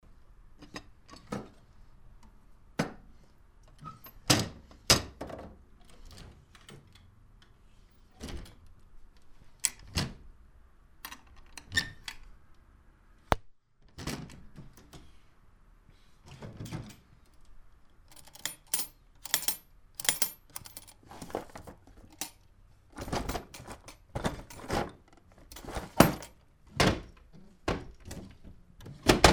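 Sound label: wardrobe sounds; kufonnyy shkaf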